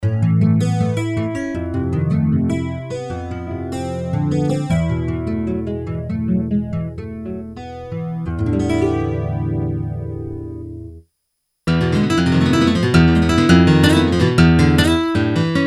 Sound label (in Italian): chitarre; Guitar; IK; iRig; SampleTank iOS